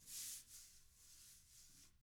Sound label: TAMA BR SW, Drums; ILIO; Double Platinum Drums 1